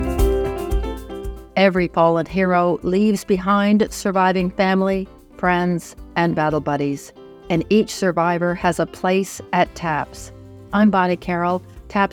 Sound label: taps